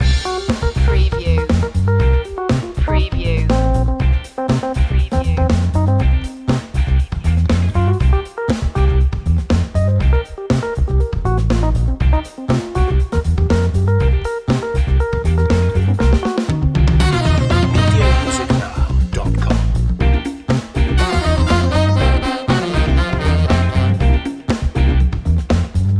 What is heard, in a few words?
on the groove loop